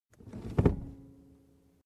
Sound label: Car Seat adjustment